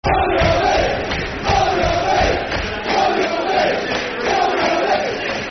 Barmy Army Football Chants (Soccer Songs), Cardiff City; fanchants-com